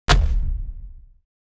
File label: fruit hit; fallguys